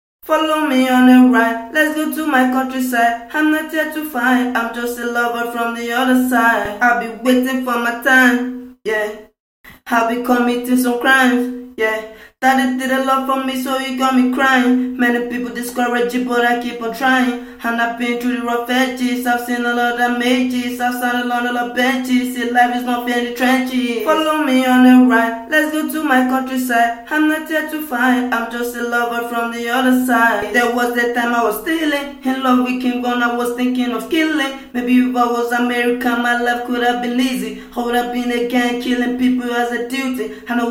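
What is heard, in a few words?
hip hop 127 BM, acapella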